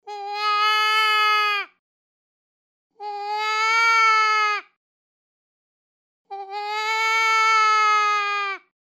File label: Lost Fawn, Deer; Dave Kelso